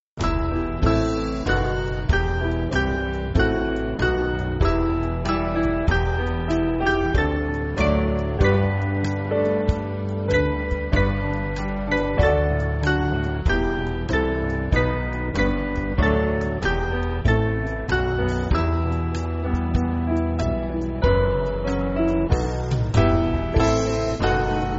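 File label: Piano, church backing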